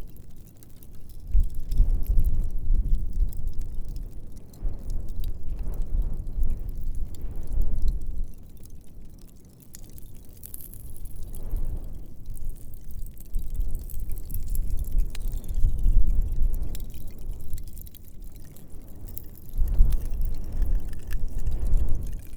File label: Lava Short Windy Crackles, Kilauea Volcano in Hawaii